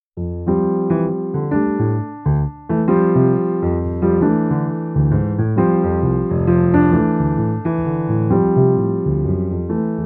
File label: Waltz